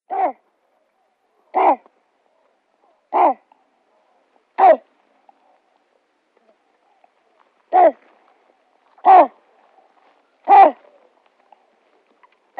Great White Pelican nestling

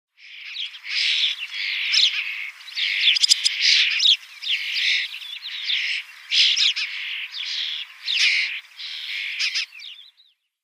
Spotless Starling nestlings

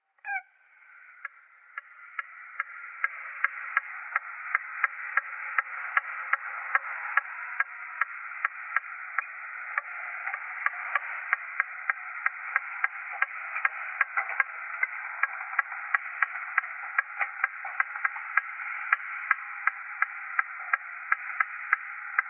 American Purple Gallinule

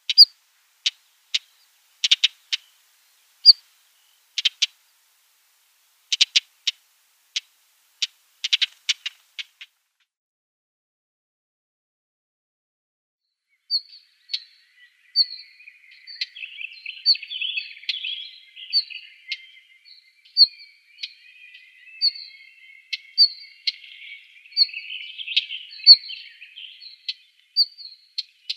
Northern Wheatear calls

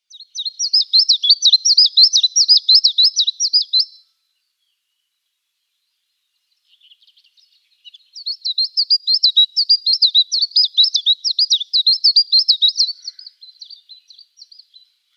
Siberian Chiffchaff song

